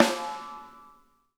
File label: snare; Sonic Reality; Interactive Drum Kits